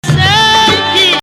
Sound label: 2nd Rast, maqam